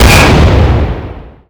ekgunnery heavyshell ap; Weapons; EK modern; Barotrauma

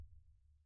sonar Tail Air Medium Beacon; Barotrauma; Real Sonar

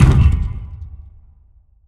DAMAGE alien Ruins Wall; Barotrauma; Room FX

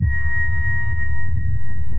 sonar Ping Suit Close; Barotrauma; Real Sonar

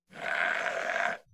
clown grunt; Barotraumatic; Deepseaclownnew

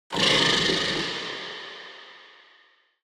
eel; Barotraumatic; Thermaleel

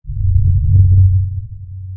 sonar Ping Air Far Beacon Directional; Barotrauma; Real Sonar

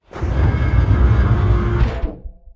maintenance panel move, Door; Barotrauma; barotrauma ek

